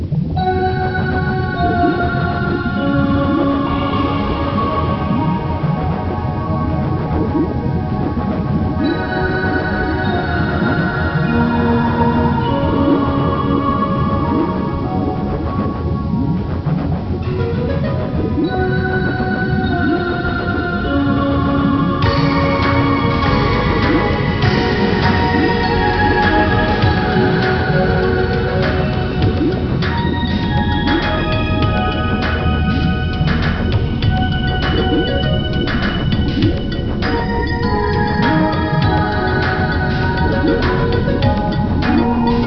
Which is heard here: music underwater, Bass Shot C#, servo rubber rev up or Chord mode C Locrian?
music underwater